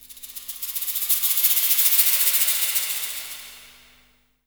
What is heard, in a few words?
SHAK, Hip Hop R n B; USB Soundscan vol.19